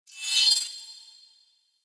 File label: Throwing Knives Running Throw Wind Up Accent; Pothia